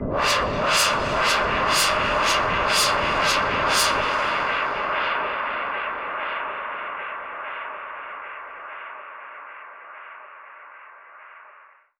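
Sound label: Modulation Proc, alarm siren samples, 120bpm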